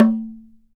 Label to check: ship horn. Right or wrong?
Wrong